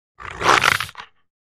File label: Dog Rottweiler Growl